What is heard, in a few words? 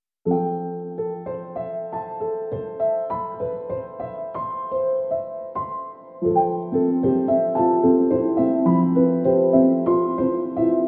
Changing Times, Period Drama Emotive Piano; melancholic